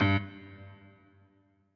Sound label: piano riff intro